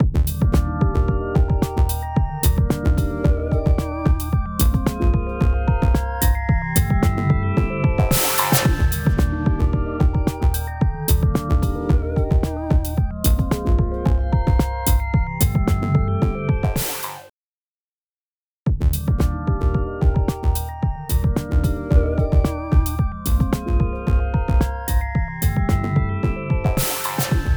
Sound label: Limiter Electronic Beat; Omnipressor